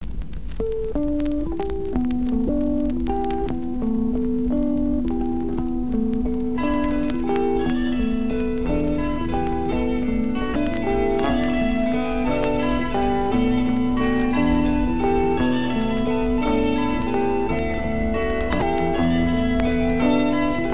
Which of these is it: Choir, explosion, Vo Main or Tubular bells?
Tubular bells